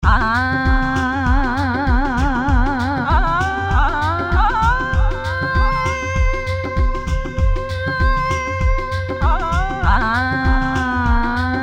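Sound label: Combination, In this Patch with an ethnic feel, the rhythm phrase and the human voice are split between Zones; V-Synth; Equator Song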